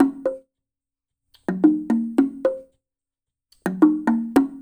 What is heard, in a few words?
LOOP, Percussion; InVision Interactive; Percussion Slam